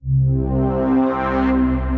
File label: Past Pad, Synth Pad